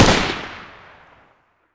sg550 fire; garrysmod

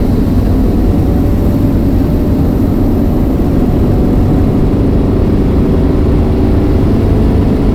fourth shift accel up, car; vehicles; garrysmod; sincar

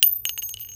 m60 bullet flick; garrysmod

